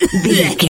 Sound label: voice male cough short